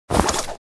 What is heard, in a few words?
Vampire supernatural speed movement